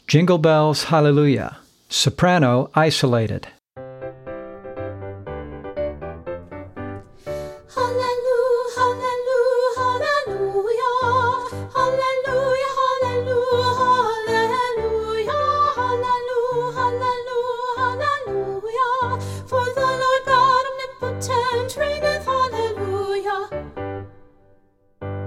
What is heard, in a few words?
SOP ISOLATED; practice tracks for singers